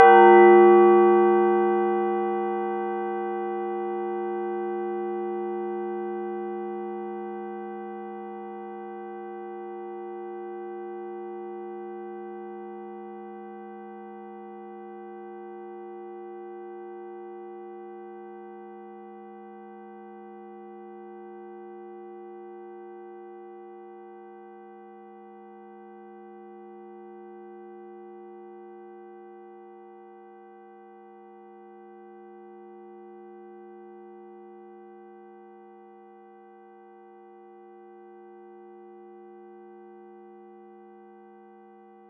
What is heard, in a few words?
synth; sound effects and sound reinforcement